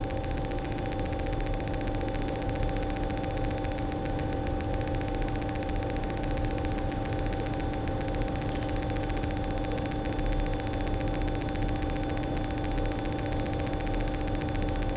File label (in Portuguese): sala de motores som ritmico